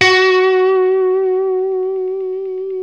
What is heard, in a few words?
LES PAUL F#4; Spectrasonics; Hans Zimmer Guitars VOL-1